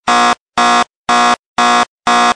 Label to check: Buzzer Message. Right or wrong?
Right